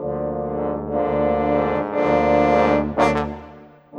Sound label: Brass, Orchestral, Rock Pop; Sonic Foundry (Sony Creative Software); Orchestral Series VOL-4 - Rock and Pop